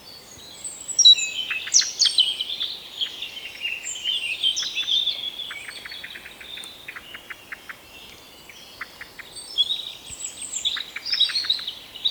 merel roep

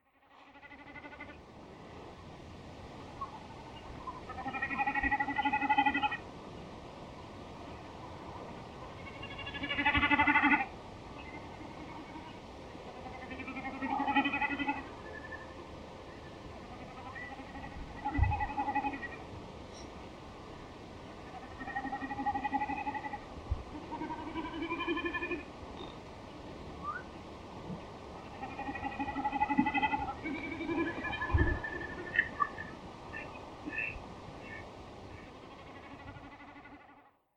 watersnip mekkeren

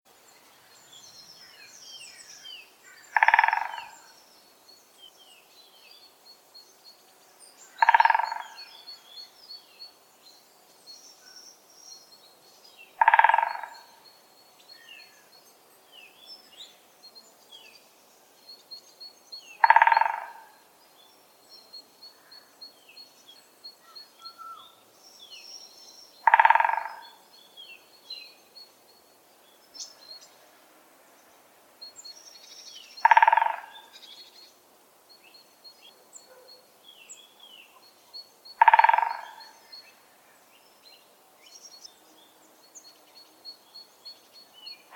grote bonte specht roffel

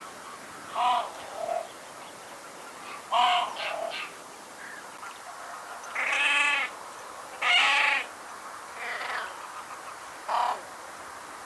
fuut baltsroep

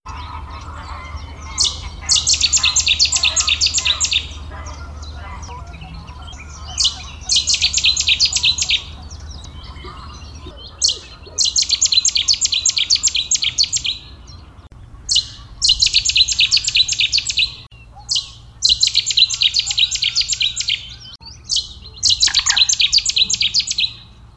cetti's zanger zang

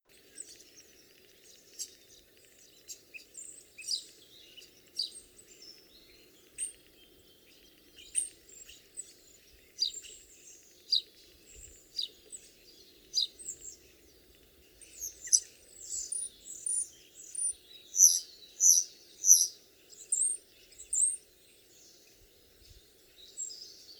spreeuw zang

